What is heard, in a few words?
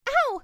Bumping